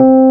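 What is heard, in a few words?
PIANO C3 p 73, Rhodes, 73 Suitcase; Keyboards of The 60's and 70's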